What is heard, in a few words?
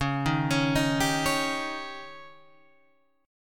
C sharp Suspended 2nd Flat 5th, Guitar, Open G; 11,8,11,8,8,11